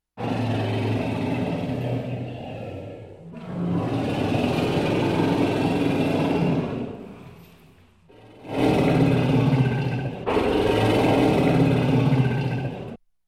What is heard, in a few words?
Large crocodile bellowing in sewer